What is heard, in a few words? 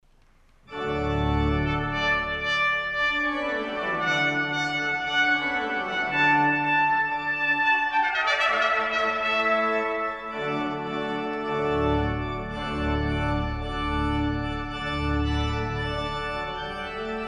ouverture; g.f.handel; suita d-dur